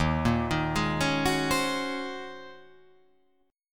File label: Eb Minor 13th, Ebm13 chord; arpeggiated; JGuitar